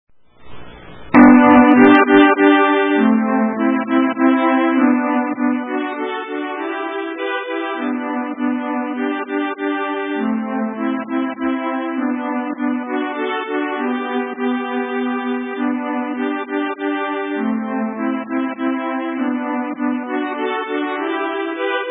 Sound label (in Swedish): mazurka